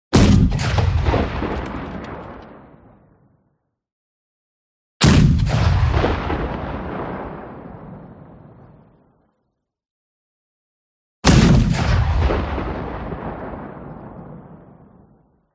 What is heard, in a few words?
WEAPONS ARTILLERY Heavy Close